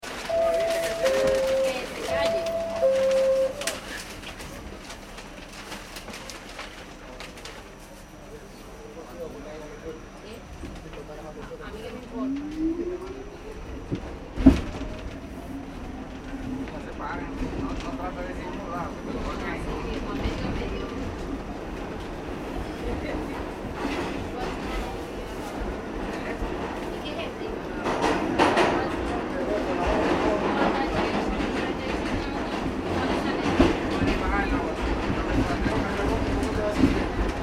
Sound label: transportation train subway start run stop internal nyc; Subway Train Running Between Stations (Onboard/Internal); Vehicles; McKinney Sound